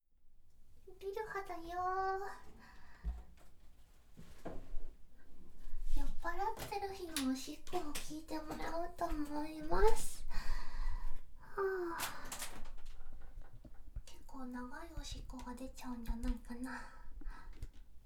お酒飲んでホロ良いおしっこしちゃうの

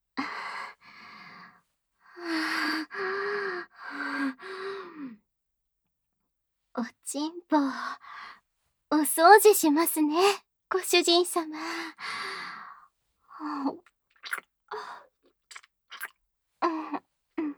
完堕ち新妻のお掃除フェラ

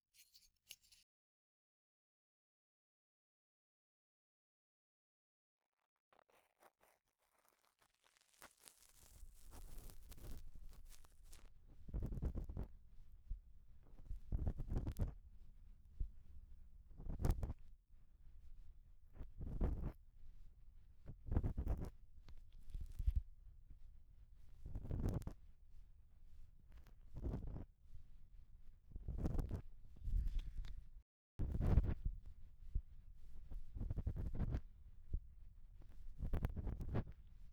(右) 匠の耳かき 耳穴入口部分の音; 耳かき音部位分けVer.

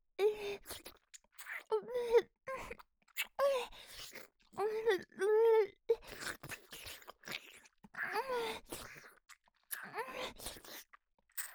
ディープキス 激しめ

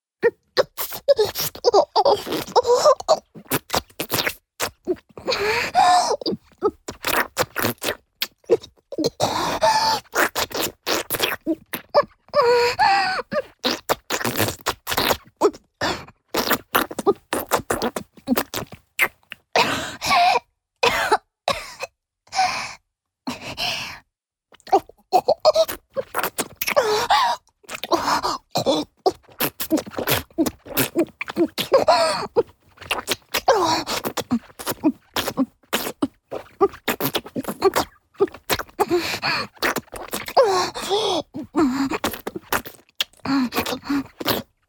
チュパ音, 高音抑制; イラマチオ(強):頭を鷲掴みにされ激しく腰を振られる

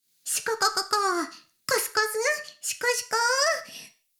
シコシコ音声, ASMR; 古き城のドラゴニート2

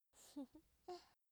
笑い声; ループボイス

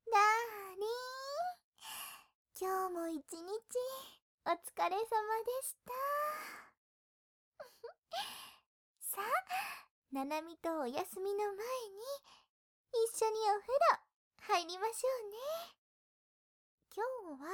耳舐め生足コキ（要望・左・エフェクトなし）; 七海さん; 癒し系専属ナースの七海さん六海さんに愛されちゃうバイノーラル

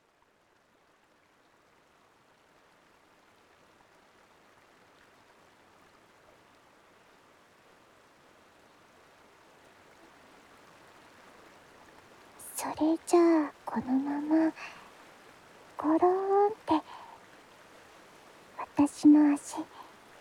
清流船上耳かき（右耳）

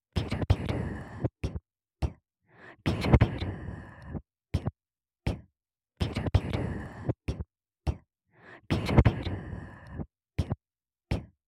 オノマトペ びゅるびゅるー; オノマトペ素材

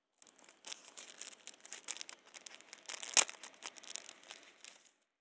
手持ち花火を包装から取り出す; 夏実と縁側花火デート

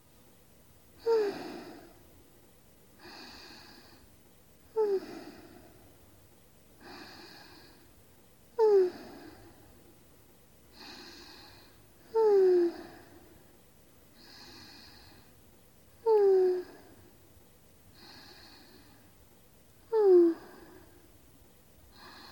寝息ループショート(通常編集）; みみあね。 ～世界で1番弟クンを甘やかすお姉ちゃん～; 囁き・添い寝・立体音響; 効果音なし

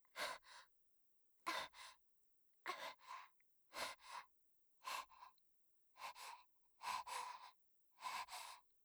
薄呼吸 酸欠の方で; 効果音あり

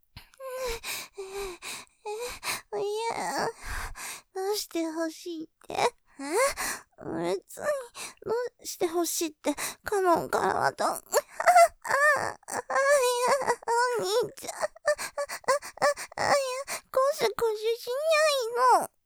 喘ぎ, ASMR; どうしてほしいって?ｗやぁ、こすこすしないで；；; ループで楽しむ！シェフの気まぐれ抜き抜きコース; モノラル

